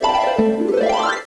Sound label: Special flower; mahjongjinghai